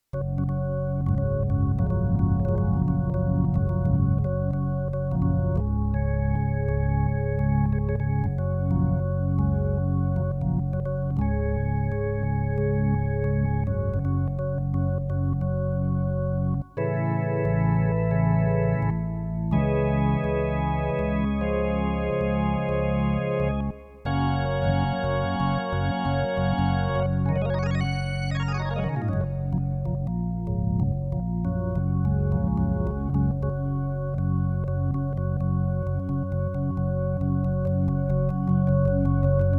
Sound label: organ; MegaEpix